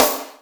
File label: Boss DR660 sd